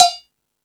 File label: Percussion, E-MU Pro-Cussion